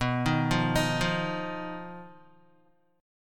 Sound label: B Major Flat 5th B Guitar; BMb5; arpeggiated